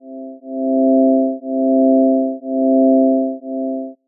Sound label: Wave